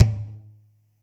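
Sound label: TOM soft; Zero-G; Chemical Beats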